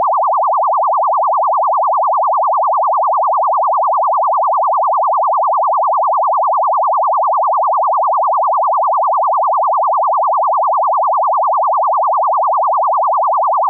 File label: 1000Hz